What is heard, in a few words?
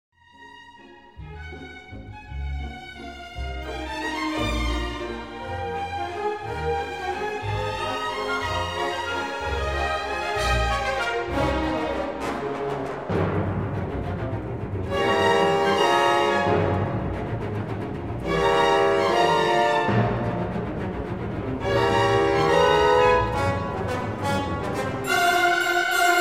Scherzo waltz; Fate; Abbado; fifth symphony on fate and love